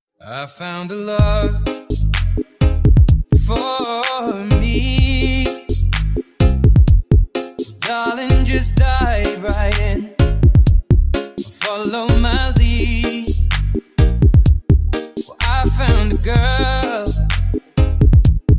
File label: perfect reggae